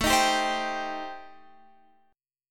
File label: A-Minor 7th Flat 5th, chord